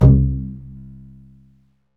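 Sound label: strings, contrabasses pizz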